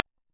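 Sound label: scroll sound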